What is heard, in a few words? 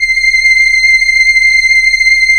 SIEL, String Machines